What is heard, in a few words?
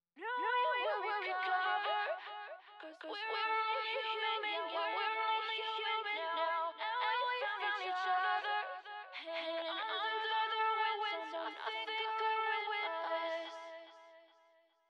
Only delay